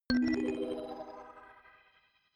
notification kalimba magic low

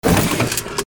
hydraulic door

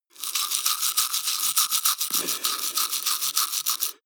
teeth brush dry open circulate

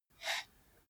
computer mouse draw short

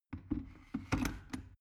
plastic water boiler place soft

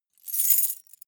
metal keys handling

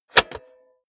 cassette player slide tray insert hard

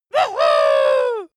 dwarf cheer "wuhu"

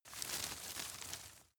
grab rustle medium

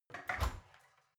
oven open soft

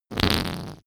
whoopee cushion fart long

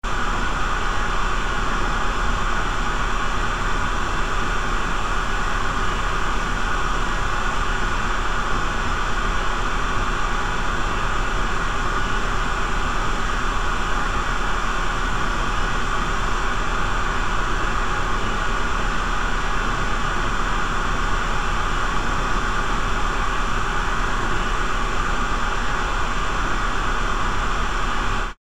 fusion machine cooling unit

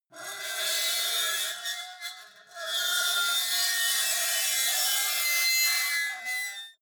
metal lid screech

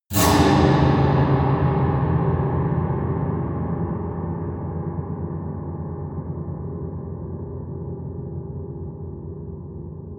piano twang multiple fast medium

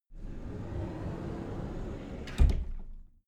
cupboard slide door open soft

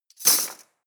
cutlery teaspoons drawer place hard

